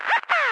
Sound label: radio random, prison; ambient; source engine; hl2; video games